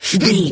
voice male effort short

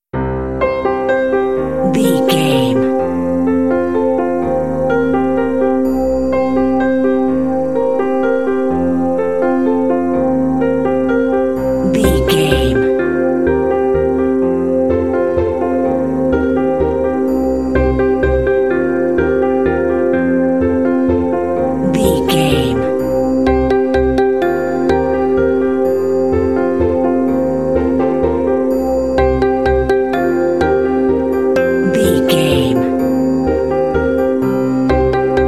peaceful morning

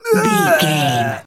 voice male grunt pain death

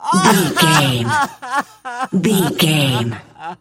voice male cry high

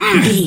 voice male hurt pain

